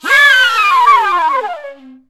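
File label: flutter, flute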